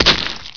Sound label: blast, zombie rat; svencoop; hunger